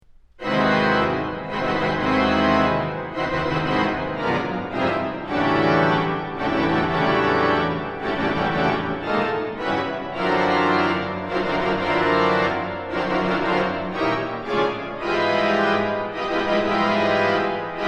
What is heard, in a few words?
Crucifixus, organ solo; Ordinary Pieces 2012